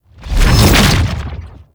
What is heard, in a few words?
Latch Fly; Roathe Summon Gulphagor; Warframe; Tau